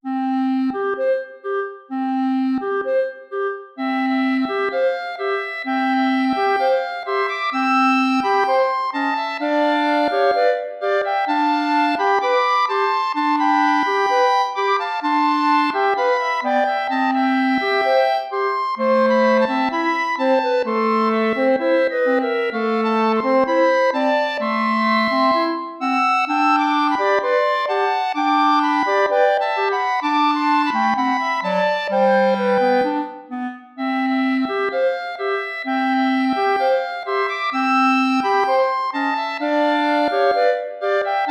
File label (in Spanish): tango